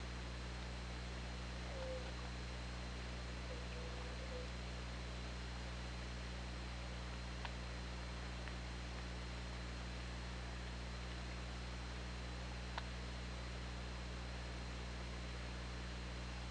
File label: OrcaLab